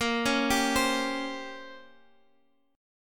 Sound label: Bb Minor 6th Add 9th Bb down Guitar Half Step Down